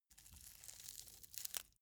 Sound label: velcro large close